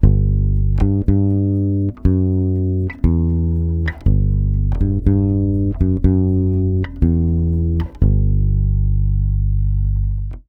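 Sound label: 120 BASS G minor 7, Brazil Bossa; USB Soundscan; AKAI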